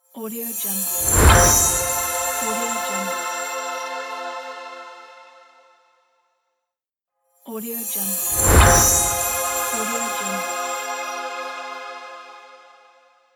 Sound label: magic transformation